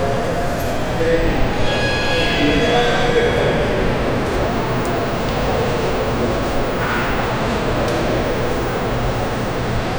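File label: subtle indoor crowd ambience, airport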